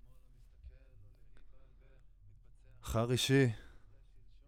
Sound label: Voc OD